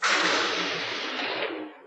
crg door open; romulan

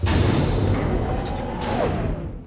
airlock open; cardassian

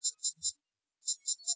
tric scan; dominion technology

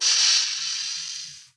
door open; federation

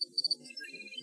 processing, computer; federation

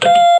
keypress, computer; federation

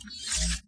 forcefield off; sona

